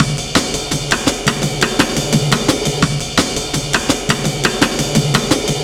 170 jazz, Drum Loops, Drum & Bass; Total Drum&Bass

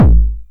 909 BD Tape Sat

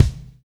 Reg. Kick; Roland BK-5

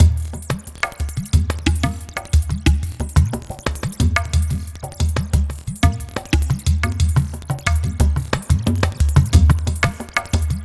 Ethnotronic, Processed Percussion

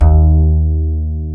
Acoustic Bass

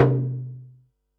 tom, Pearl SC-40 Toms

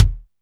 Kick, Alesis DM5

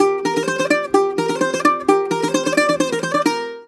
130, BOUZRA130, Mediteranean Traditions; USB Soundscan vol.53; AKAI

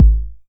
909 BD; Goldbaby Tape808 & Tape909; The Tape 909

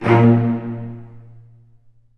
Cel Spc A#1; Digital Sound Factory Virtuoso

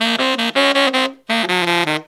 160 BPM, Horns; Zero-G; Phantom Horns

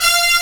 Impact; Ganbare Goemon 2 - Kiteretsu Shogun Magginesu!; Boss

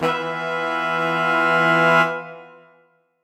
Horn Swell Emin9; undercover samples

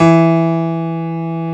PIANO, Pianos Mixe

